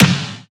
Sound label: snare; Slate; SD MIDNIGHT Sample Pack